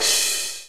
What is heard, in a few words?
soft hit finish